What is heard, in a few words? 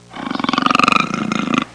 Jungle cougar growl